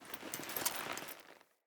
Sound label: Foley Move Short; Friendly; Deora